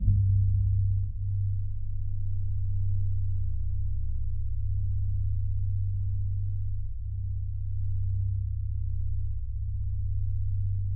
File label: C1, Synth Line; 90 s Sample CDs; NiceBeats; SynthLine VOL-32